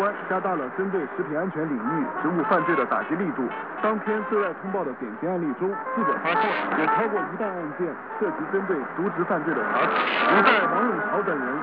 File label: Chinese jammer on 18080 kHz; Sound of Hope